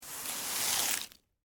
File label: Impact Broom Sweep, Wood, Debris, Single; Cleaning